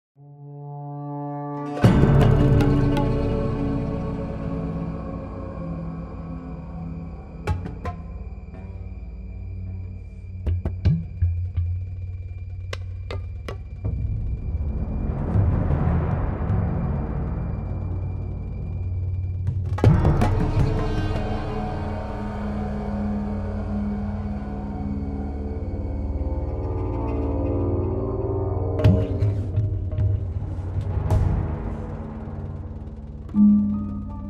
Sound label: Loop Stealth